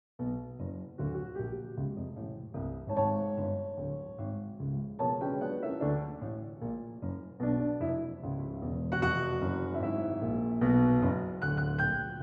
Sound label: Serious variation